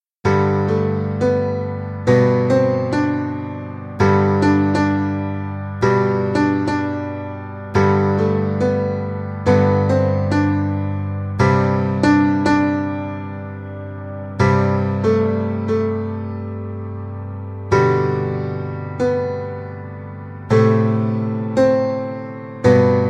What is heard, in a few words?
Waltz